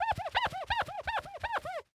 Household Glass Cleaning With Paper Towel And Glass Cleaner Squeaking